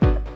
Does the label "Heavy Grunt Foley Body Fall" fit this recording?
No